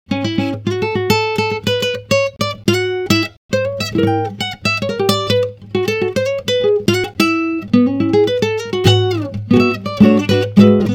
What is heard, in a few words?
raw solo